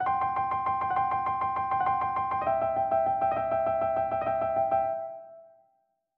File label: Rock Piano